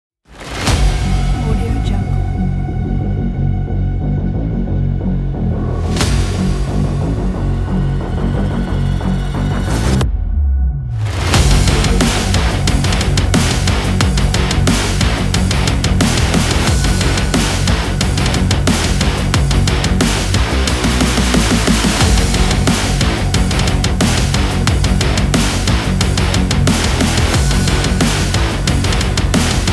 powerful metal trailer

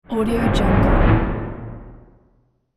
futuristic space boosters

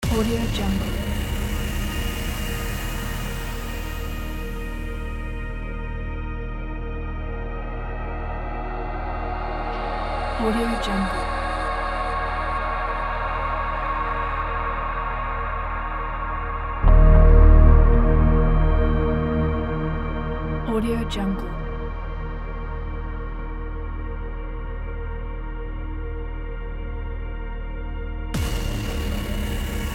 sci fi invasion trailer

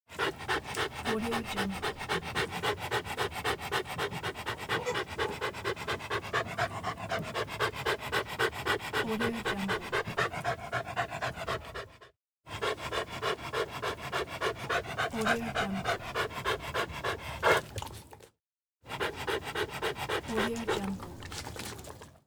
dog panting

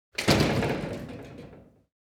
half glass door

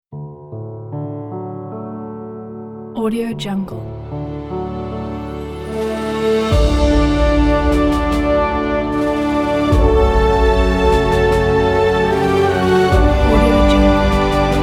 epic opener logo

